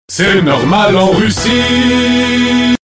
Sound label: jingle; mrantoinedaniel; c'est normal en russie